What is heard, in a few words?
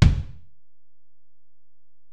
Ac BD, Alesis DM-PRO; 300 Drum Machines